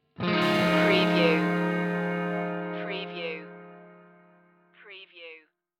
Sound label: information age stinger